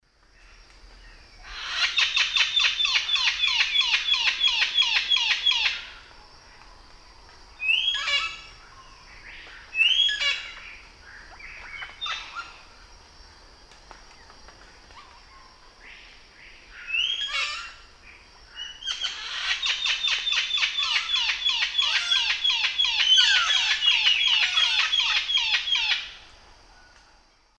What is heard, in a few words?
Deroptyus accipitrinus, calls; S. America; Eastern Lowlands